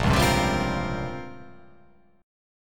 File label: A sharp Minor 6th Add 9th A sharp down, chord